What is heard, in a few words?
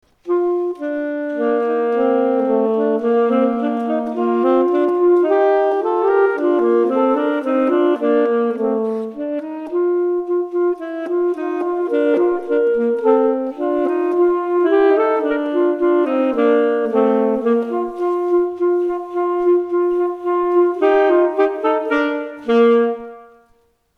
Duet